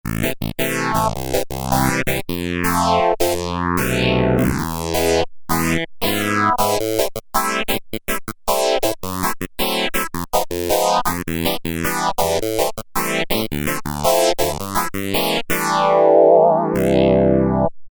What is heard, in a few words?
Pulse Comp, Korg MS2000; Factory Patches